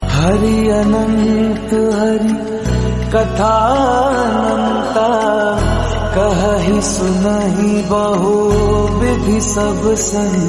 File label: hari anant hari katha ananta, devotional, hindi